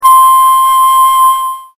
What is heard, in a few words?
flute hi